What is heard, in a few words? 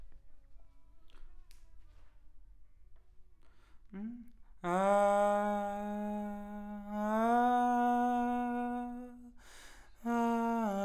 Choir